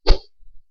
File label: Axe Slash